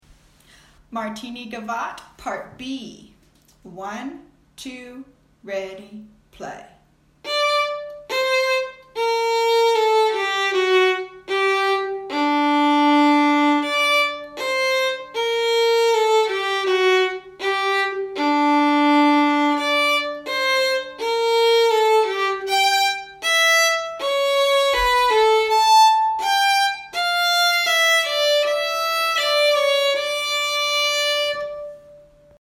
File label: Gavotte Part B; Martini